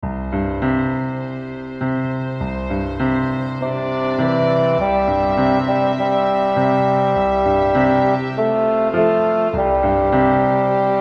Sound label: ukulele; Rainbow Ukulele Play-Along